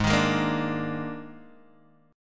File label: D sharp Major 7th Suspended 4th G sharp